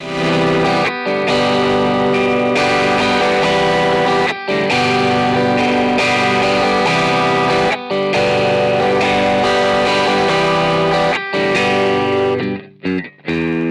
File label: guitar